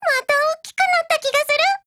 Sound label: levelup; Dusevnyj